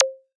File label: draggable Lift; fraction feed the frog